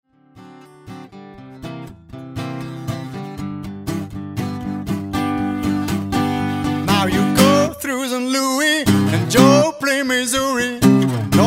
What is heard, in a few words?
blues sample